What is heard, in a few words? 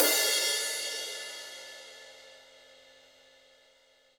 HiHat & Crash, Roland TD-20; Crashes; HiHats